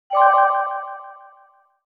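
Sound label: Arcade score interface 8Bit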